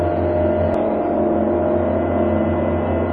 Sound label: metallic